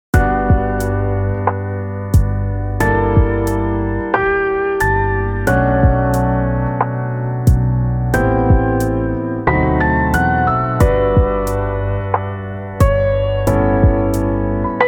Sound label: Raining Lofi; 추억 돋는